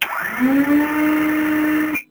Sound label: Shut-off Valve Close, Aircrafts; DCS